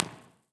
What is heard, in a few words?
Greek Theater; EchoThief Impulse Response Library; Mills